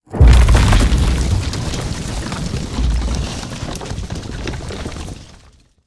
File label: ground; smite; pele